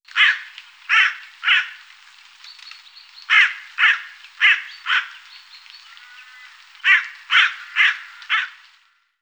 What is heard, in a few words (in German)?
Rabenkrähe